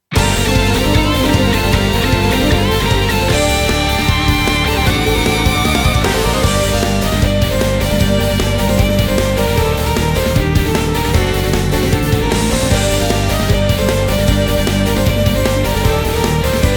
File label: Battle rapier loop; otome the exorcist